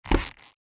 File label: foley crutches on wood floor with handle squeak single